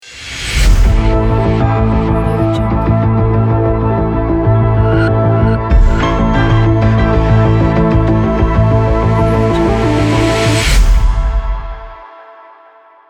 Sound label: the science logo